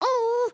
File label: need to pee again; emotes